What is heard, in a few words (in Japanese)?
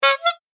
パフッ